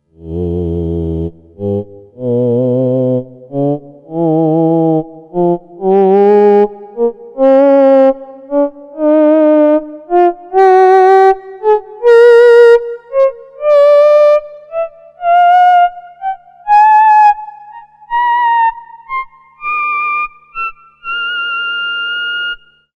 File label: theremin